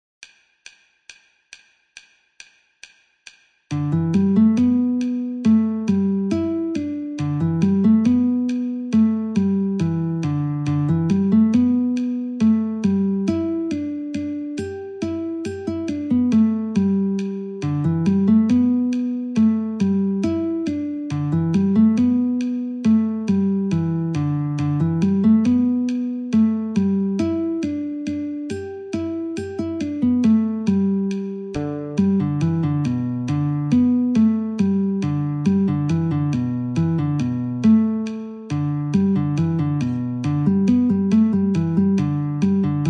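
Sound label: G Guitar metronome 69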